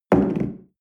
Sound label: espresso place tablecloth